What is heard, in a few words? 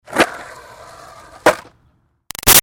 Skateboard Jump Wheel Spin Land; Skateboarding; Sports; Big Room Sound